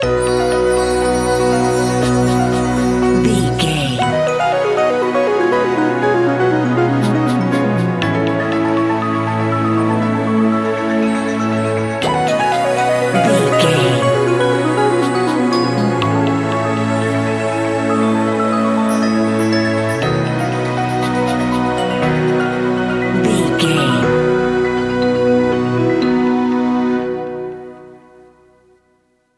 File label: magical video game level